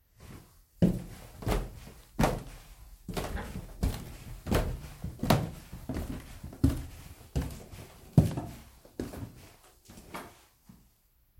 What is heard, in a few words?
Walking up the stairs